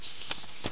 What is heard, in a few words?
PAGETURN; AMBER